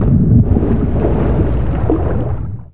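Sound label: jump underwater, impact; SINDEMO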